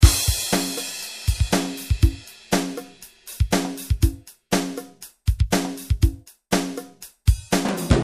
Rimmage Kit

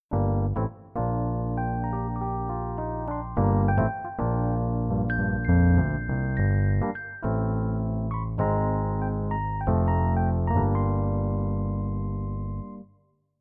Rhodes MKII

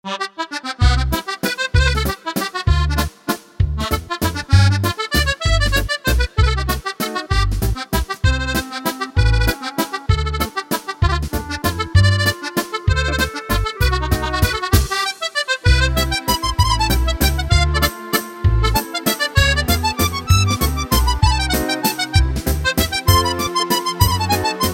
musette

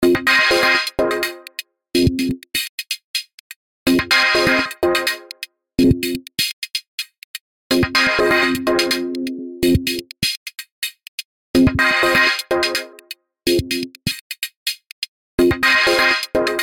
Seq Loop Pultec EQ

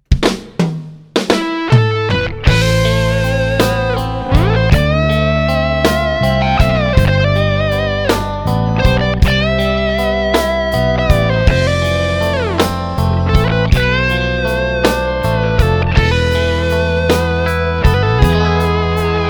California

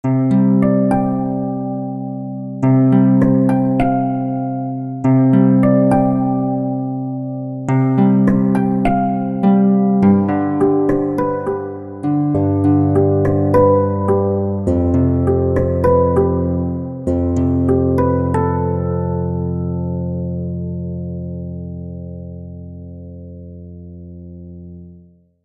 harp

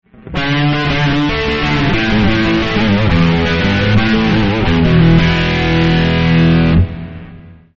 flangitis

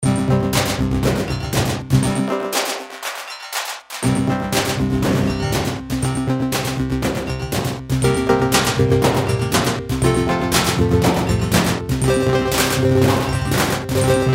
trash beat

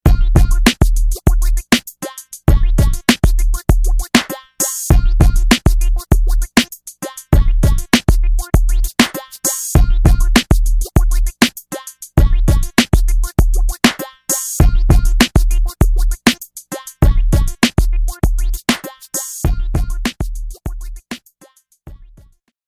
EMX Hip Hop

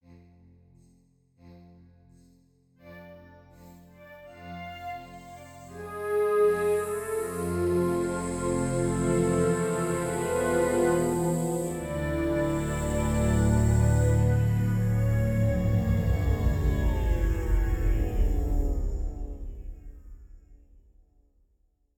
Exobiological

